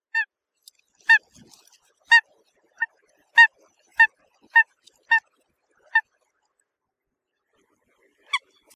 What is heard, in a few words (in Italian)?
Folaga